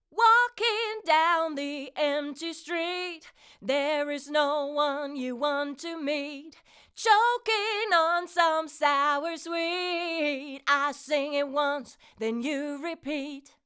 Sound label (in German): Vocal ohne Comp 554; Golden Age Project; MK II